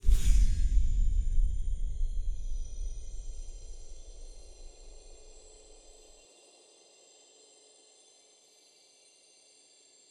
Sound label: Forest Flower